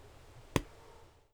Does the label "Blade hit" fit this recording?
Yes